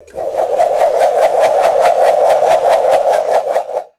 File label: fast light spinning fluttering whoosh